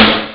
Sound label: sdrum; Amiga Soundtracker Sample Packs